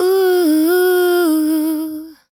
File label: Ooh C